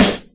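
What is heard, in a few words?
hip hop snare; Dr 202 Kit 1; Percussion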